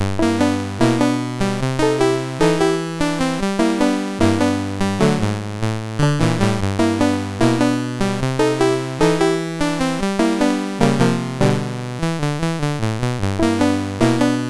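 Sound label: Maze, MOS 6581 SID chip music, games; hvsc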